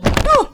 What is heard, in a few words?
Thump And Grunt